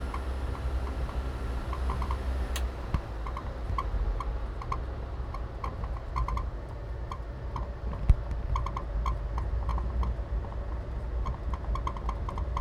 industrial squeeks on train, South India; South India street recordings

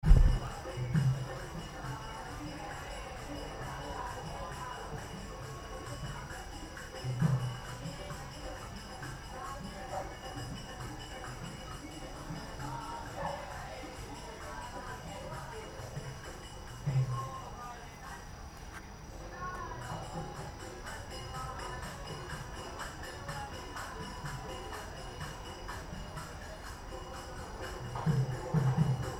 hari krishnas distant, Vietnam; 2019

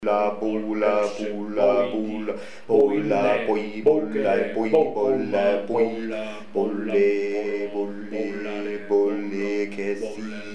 bou chanting, Spanish street recordings

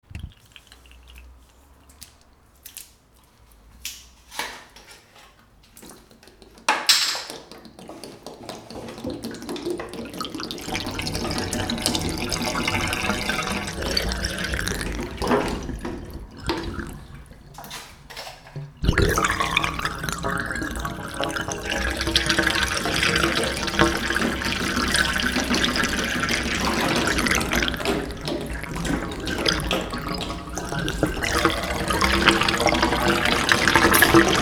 water gurgle nice; filmscore

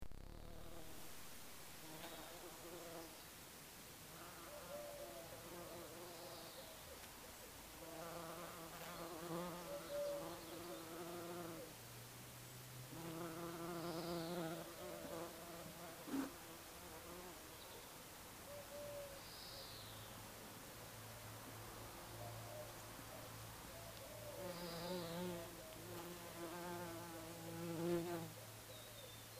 bees

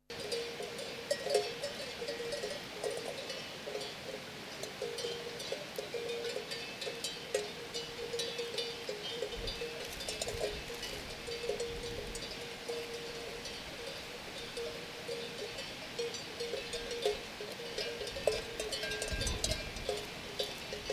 cow bells and explosion camino santiago; Spanish street recordings

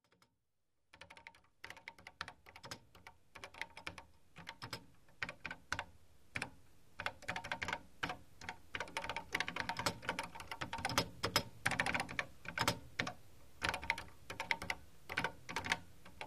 computer typing sparse version

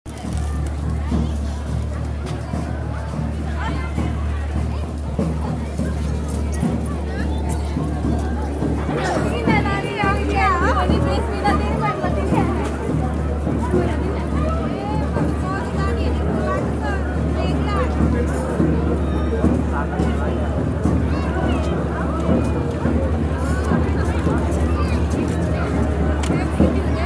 Kathmandu buddha; Kathmandu Ambient sounds

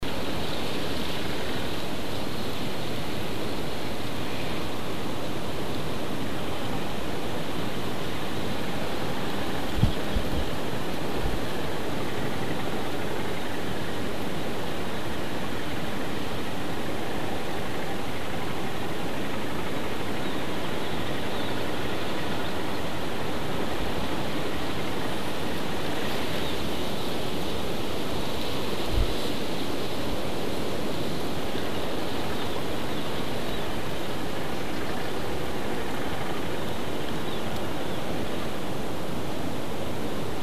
white noise sea blur; Spanish street recordings

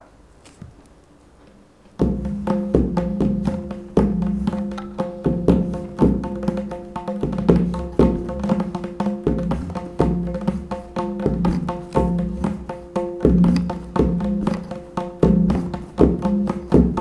Tabla Train SITAR DRONE AT END

